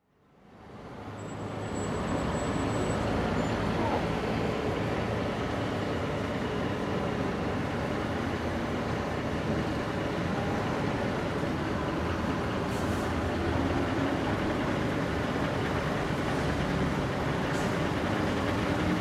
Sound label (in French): port barcelone